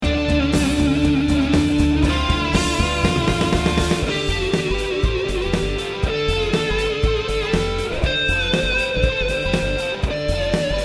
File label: solo